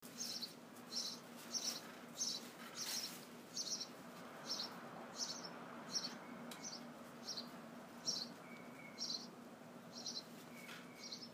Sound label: Backyard Sounds